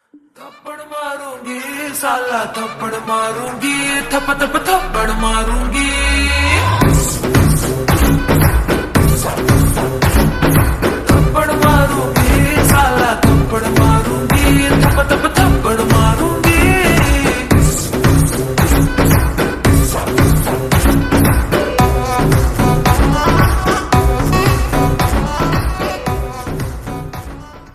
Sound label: Slowed Reverb Ringtone